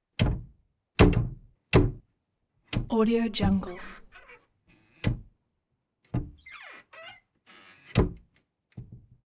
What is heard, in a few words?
cabinet door large wooden open close